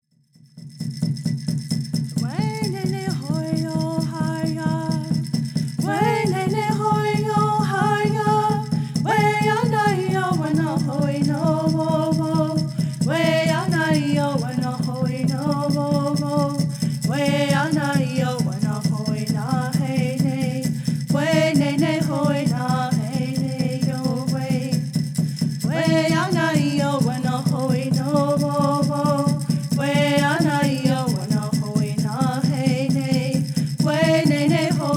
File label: Straight Song